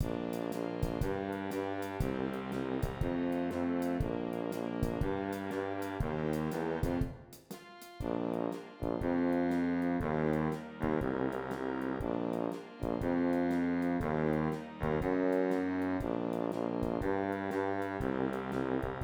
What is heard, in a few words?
tuba; jul